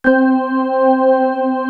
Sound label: VFX ORGAN; East West; Global Sounds; VFX-SD